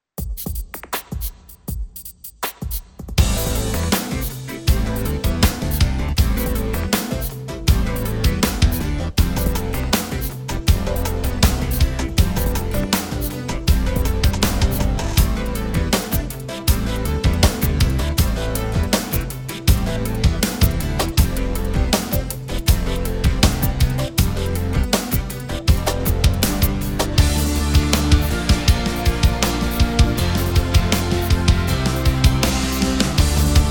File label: full solo backing